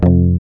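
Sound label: GUI F, acoustic guitar; Best Service Gigapack 2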